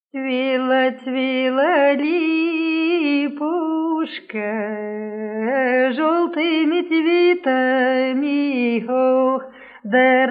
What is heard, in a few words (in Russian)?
Цвела, цвела липушка свадебная